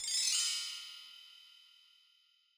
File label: Bell Tree; Yamaha DJX-2B